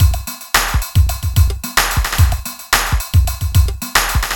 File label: r n b kit 110h